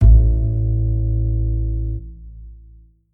Bass Note; Bendy Lone Wolf

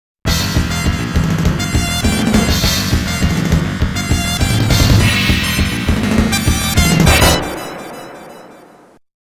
Mission Clear; Mission 5 Clear; TAITO SOUND BULLETS (2025)

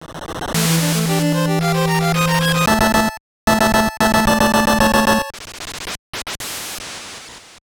All Stage Clear; Rockman No Constancy hack; NES

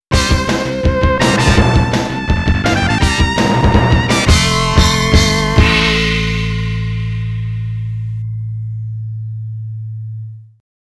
Mission Clear; Mission 6 Clear; TAITO SOUND BULLETS (2025)

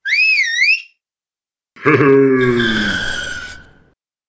Photo shooting; Talking Santa; Android

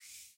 Hand Move

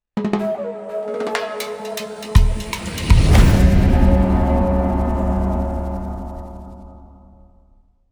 Land Crab

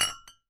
Impact Flask

